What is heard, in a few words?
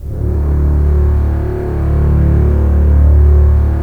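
STR CBS, Strings; Roland - LA Composer vol. 4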